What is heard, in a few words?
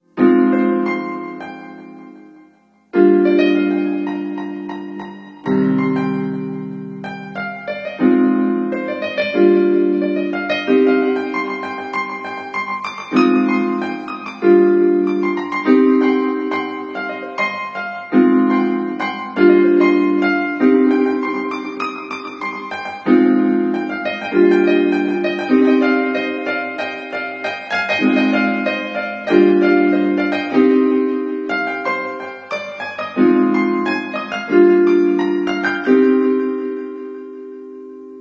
Piano